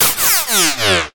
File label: sound design descend fm; sound effects one